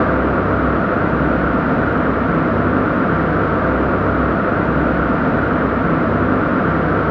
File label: Texture Loop, Techno